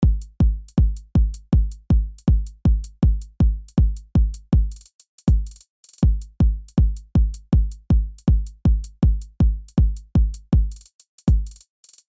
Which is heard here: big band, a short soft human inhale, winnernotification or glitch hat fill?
glitch hat fill